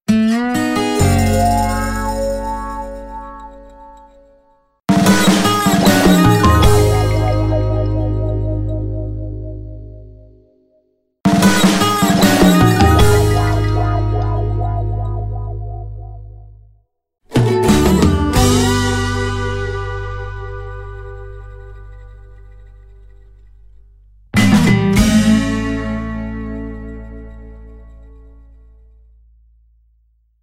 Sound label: jingle slide guitar